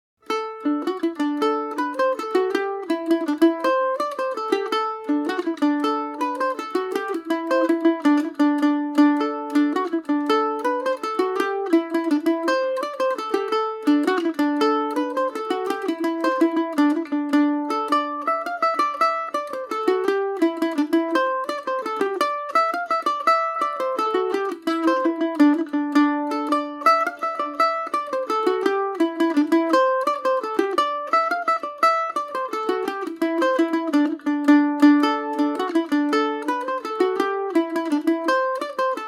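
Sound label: D mixolydian paris swing, irish mandolin; hags purse; The Hag’s Purse; Paris Swing Macaferri mandolin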